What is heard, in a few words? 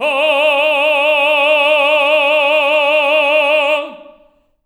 TENOR F D4; Spectrasonics; Symphony of Voices